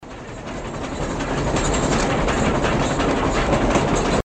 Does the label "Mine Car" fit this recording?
Yes